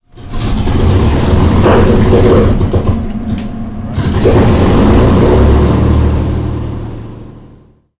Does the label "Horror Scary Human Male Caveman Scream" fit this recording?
No